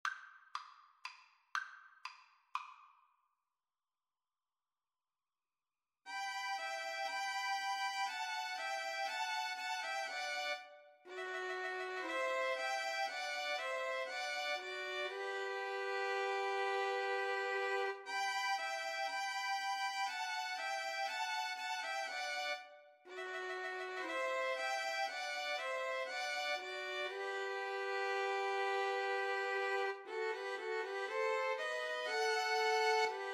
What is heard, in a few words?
Spanish Dances VLN trio